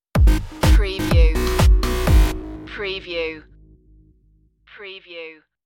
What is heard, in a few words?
pumpd up stinger